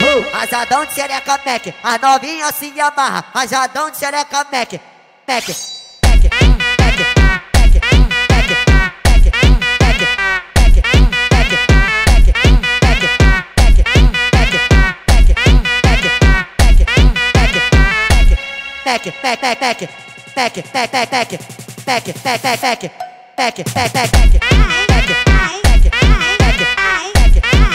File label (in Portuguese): Speed Up